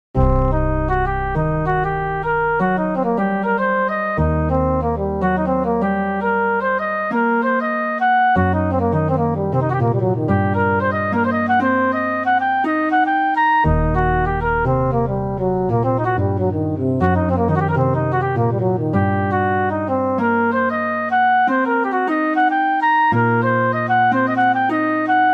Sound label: eleven limit pentatonic